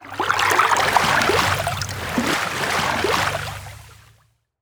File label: environment water calm